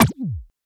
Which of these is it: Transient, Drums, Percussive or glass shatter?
Percussive